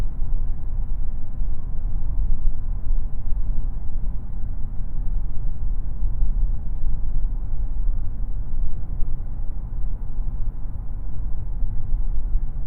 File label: Midsize Car 100Kmh binaural